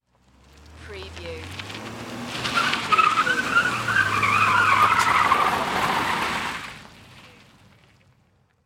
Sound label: Car Tires Screech